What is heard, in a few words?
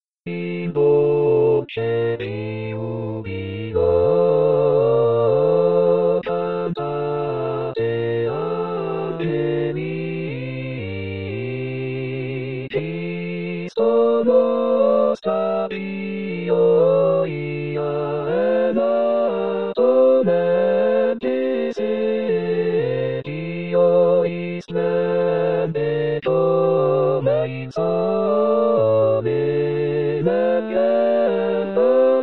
in dolce jubilo; bach